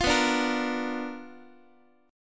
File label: B Minor Major 7th Flat 5th Guitar